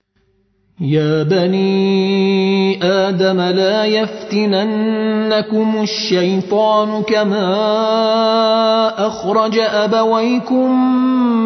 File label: Tar